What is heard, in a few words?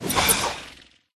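pterodactyl dodge; pterodaktilj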